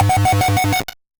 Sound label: Noise A, 8-bit, Bitty Noises; musicradar; 8-bit bonanza